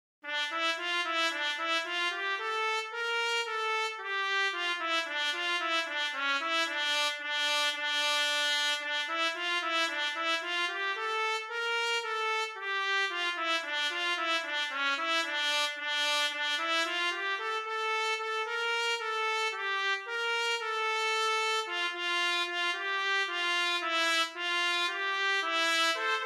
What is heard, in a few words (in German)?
Czardas Trompete